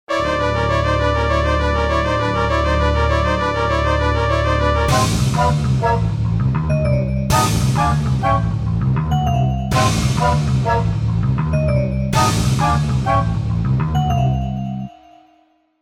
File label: Fanfare